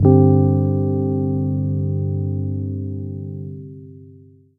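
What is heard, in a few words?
EPIANO Soft Rhodes Chord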